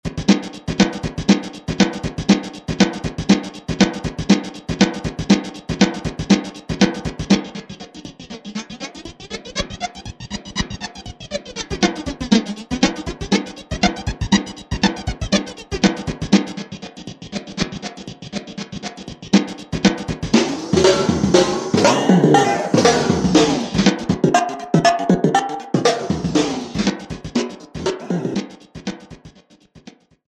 Roland V-Synth; Dustbins; Factory Patches

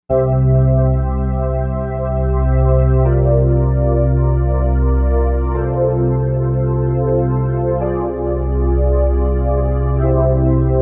Pop Pad

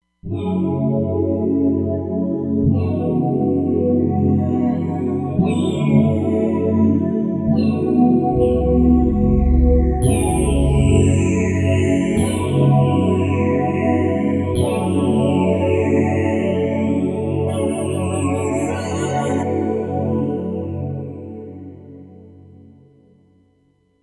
Digitvox; Roland Fantom XR

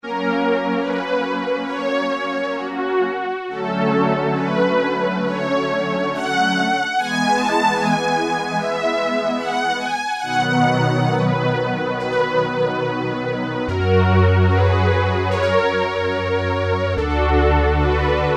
Bowed Strings, Roland MKS-70

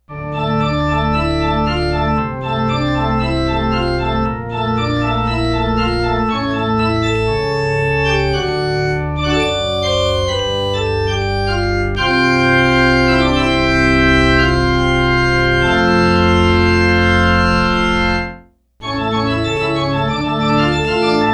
PIPE ORGAN POSITIV

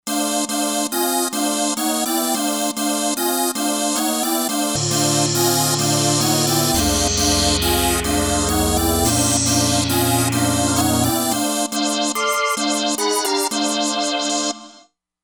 Synchro Choir

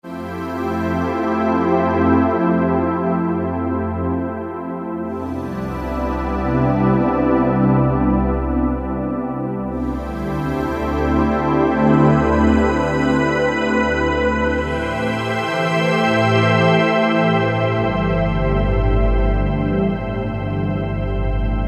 Padanomic, Korg N364; Factory Prog demos

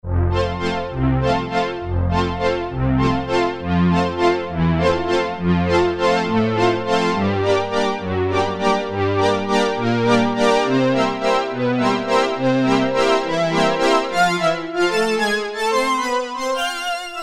Orchestra, Roland MKS-50; Factory examples

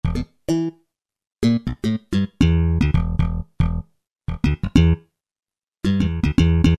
Slap Bass, Kurzweil K2000; Kurzweil K2000 Value Pack; Extra Programs

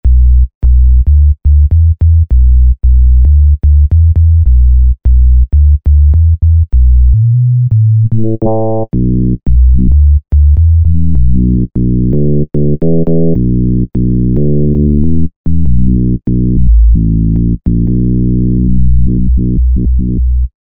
Sub Synth Bass; Apple GarageBand 2

